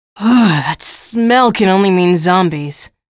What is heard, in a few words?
alert zombies